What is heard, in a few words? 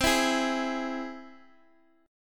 C Augmented C down Guitar Half Step Down; chord